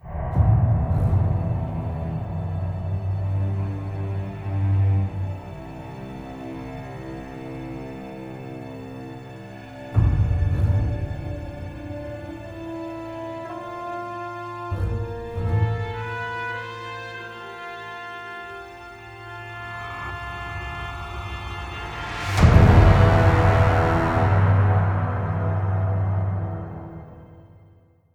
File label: Cutscene Music; Neuron